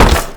chest drop

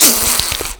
spider spit roar

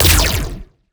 red lightning strong

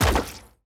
bounce large; fistula

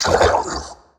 poisonmind hurt

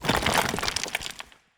explosion debris

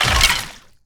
bone death

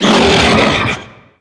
roar; carrion queen

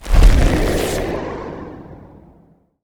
character menu flip dark

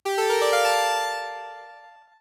game restart; horizontalrunner

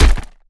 head hit; finalmother; isaacs

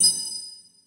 soul pickup

